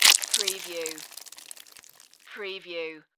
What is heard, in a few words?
Squish Splat Blood